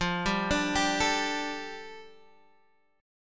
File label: G Suspended 2nd F, Guitar; Gsus2/F arpeggiated; JGuitar